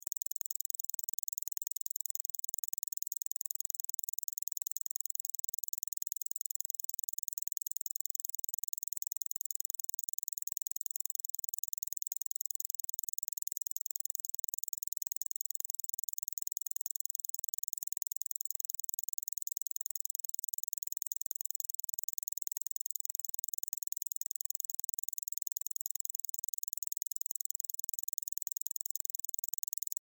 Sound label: Stop Watch Tick Clock; Sports; Clocks; Machines